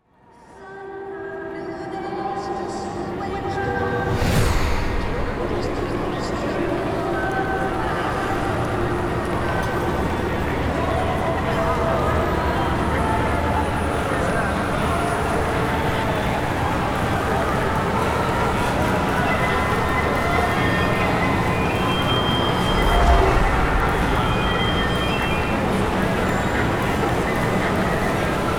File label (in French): Bruitages SF; Japon